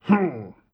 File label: hrrr